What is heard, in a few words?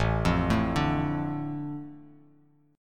A Suspended 4th Sharp 5th down, chord; Asus4#5; arpeggiated; JGuitar